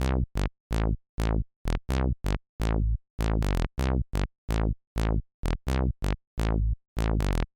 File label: synth bass mehr schmackes